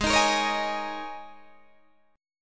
Ab Suspended 2nd Suspended 4th Ab down Guitar Open D